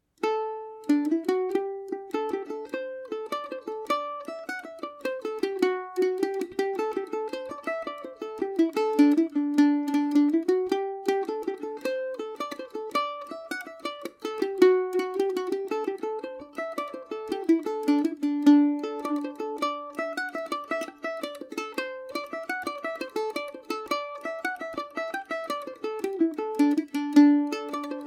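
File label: D mixolydian paris swing, irish mandolin; garrett barrys; Garrett Barry’s Jig; Paris Swing Macaferri mandolin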